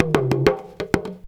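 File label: small tama groove; Best Service; World Colours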